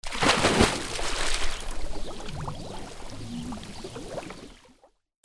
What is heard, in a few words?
WATER